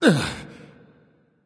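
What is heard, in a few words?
nonverbal surprised; smite; voicepack; ullr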